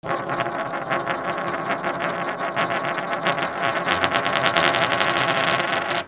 MONEY

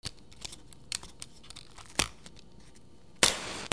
FIRE

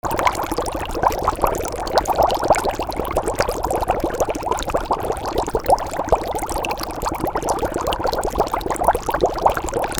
WATER

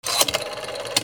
PHONES

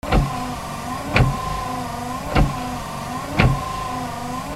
CARS

